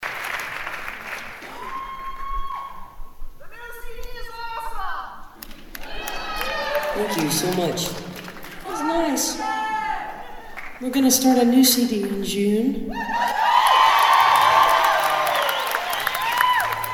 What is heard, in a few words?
crowd talk; last record for epic